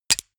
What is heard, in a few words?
click